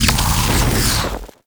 Flame Thrower Shot; Drill Sgt

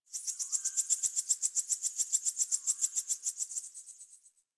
Tree Critters Happy Calm, Ambience; Props; Warframe; Duviri

